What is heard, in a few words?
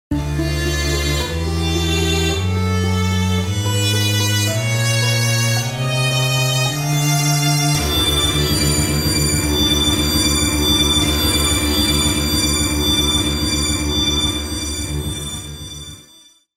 Evil Whole Tone